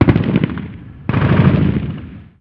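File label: gun us assault medium; ModernWarfare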